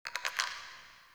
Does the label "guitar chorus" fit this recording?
No